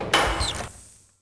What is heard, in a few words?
door glass